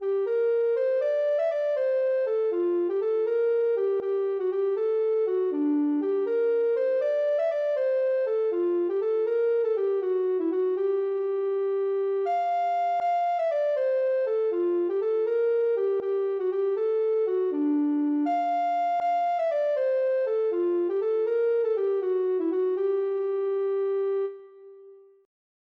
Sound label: greensleeves, ocarina